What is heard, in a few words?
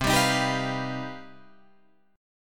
C Minor Major 11th C down Guitar Drop D